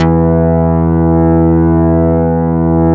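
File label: E1 ANA BS; Synclavier Sampler Library; KEYS AND GUITARS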